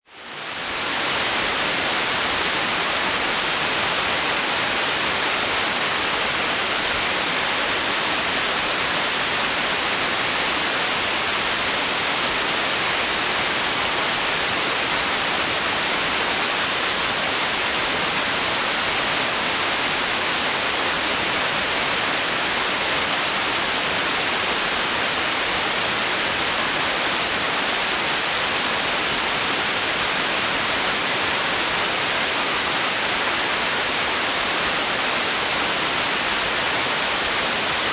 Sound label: Weather Rain On Lake, An even, heavy rainfall, rain falls on lake or other water surface